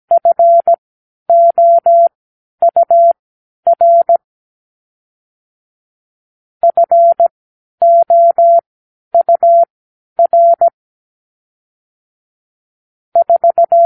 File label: Numeral Four (4), morse code